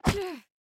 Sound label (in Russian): Звук удара карты о карту